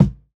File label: Kick, jungle breaks drum sounds